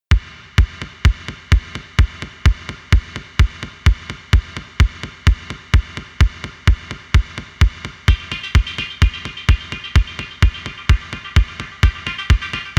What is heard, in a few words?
spacedock ambience